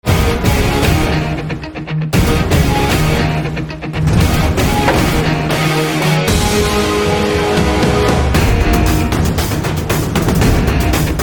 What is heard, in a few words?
Guitar Version; STAR PLUS